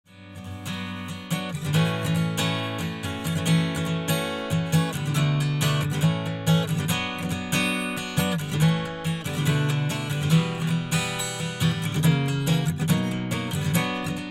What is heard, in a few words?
AG Solo